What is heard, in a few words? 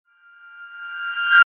Ring Crescendo Abrupt; High Tone